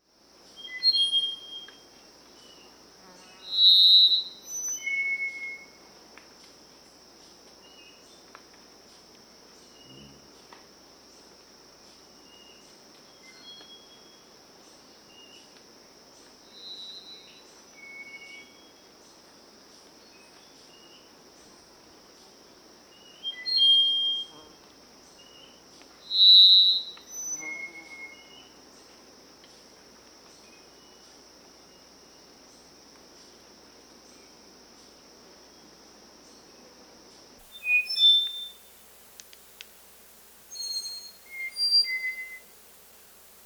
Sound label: Songs and calls, Birds